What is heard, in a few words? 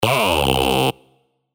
Synth Shot C, synth shots tech house; Chatterbox; revealed